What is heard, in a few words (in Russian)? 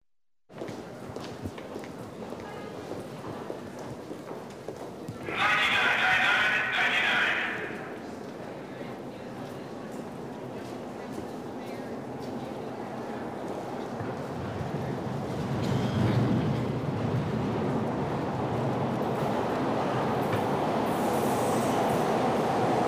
metro ctanciya pribitie i otpravlenie poezda